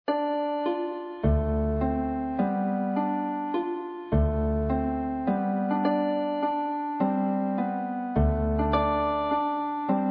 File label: old joe harp